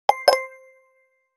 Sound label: ui sound for a popup